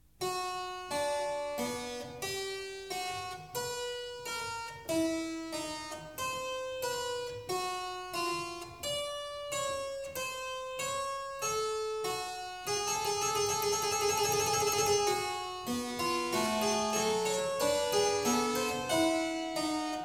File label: Fugue in B minor, clavier; Prelude & Fugue No.24; BWV 869; BACH; WELL TEMPERED CLAVIER; BOOK I